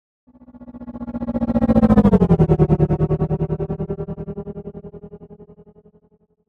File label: Spaceship